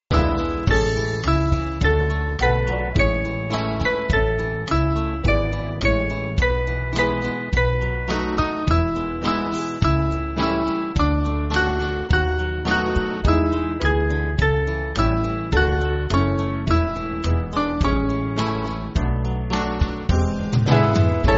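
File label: Band, Music Backing Files for Churches; St Drostane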